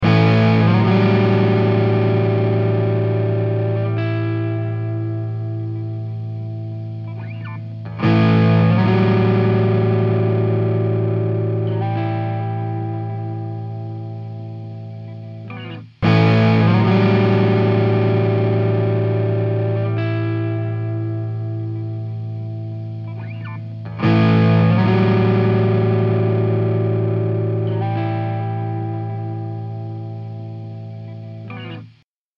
A 120 Fuzz Chords

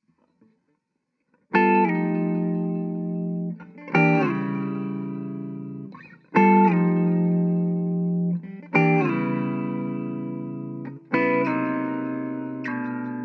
E100 Jazzy Inside Chords Impro

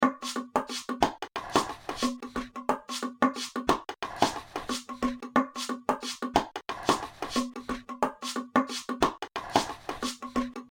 KALIMBA

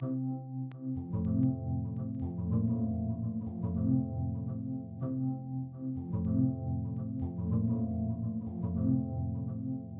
pans

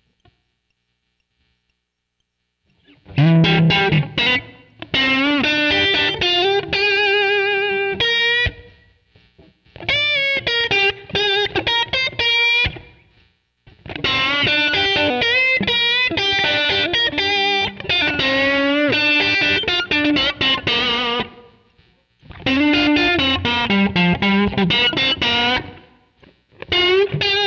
Austin Stomp; admiralbob77

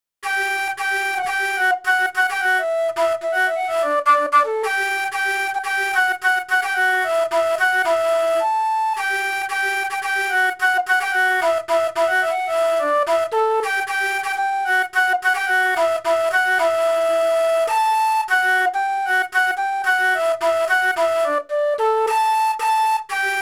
Guitalele

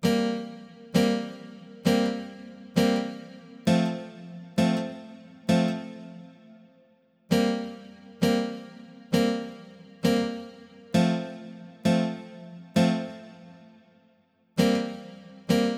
guitar

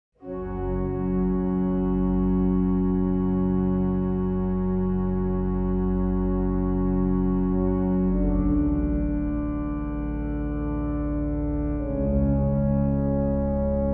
organ

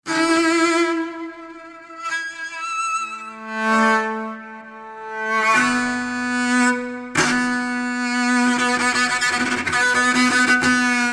The Man Who Played One Chord

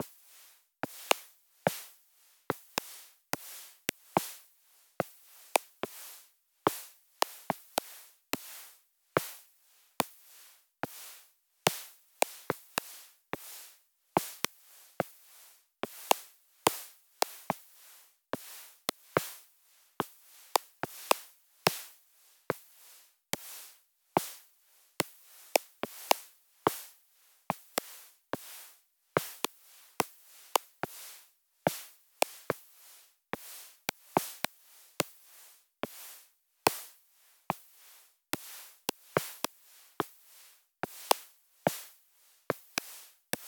D72 Rhythm

